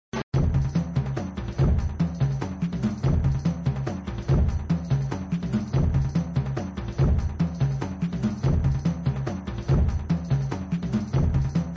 Music looping; Get the Idol music; Indy

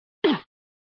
Sound Hit; Hit v1; Indy

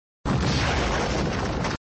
Sound Explosion; 1 Droptarget

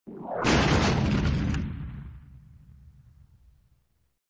Tone Woohoo Bang; flipper

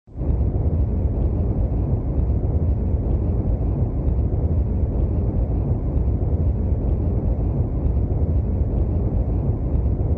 Sound SFX, flipper; sttng

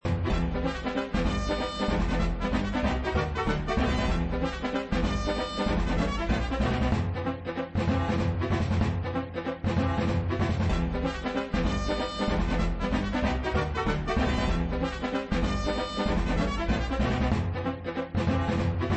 Music multiball music looping; Totem multiball music (looping)

